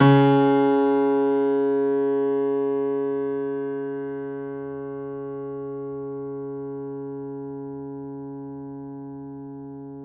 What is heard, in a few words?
String Pluck, Piano; Piano Miscellaneous